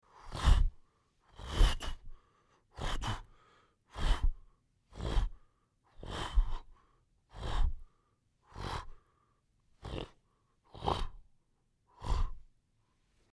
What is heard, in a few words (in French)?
mouvement drapeau; Supporter; mouth-fishing